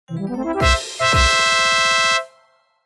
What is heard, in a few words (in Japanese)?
ファンファーレ; ゲーム向け